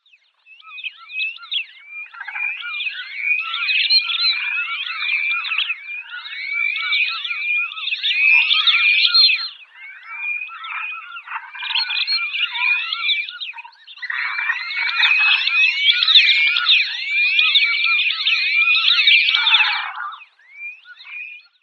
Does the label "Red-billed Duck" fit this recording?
Yes